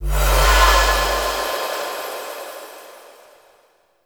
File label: Respawn Stone Activate; Warframe; Duviri; Ambience